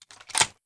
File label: mp5 admire mag, weapons